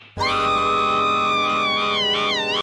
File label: flaky scream; happy tree friends